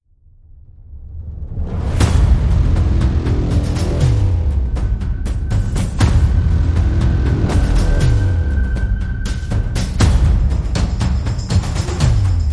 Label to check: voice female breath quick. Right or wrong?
Wrong